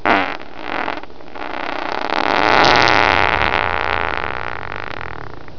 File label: fart